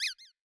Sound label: squeak lower vol